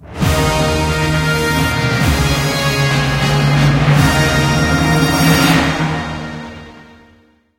scatter win; rome

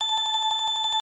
count Up Loop; warlords

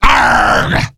knife man win; rage of the seas

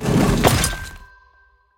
stone Door Close; temple of nudges

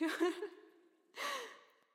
giggle; wingsofriches

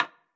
hit Soft, roulette; advanced-roulette

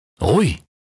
panda Medium Win; shangrila

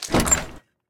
bonus chest; bloodsuckers2

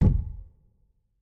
door Slam; the wolfs night

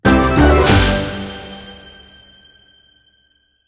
mediumwin; irish pot luck

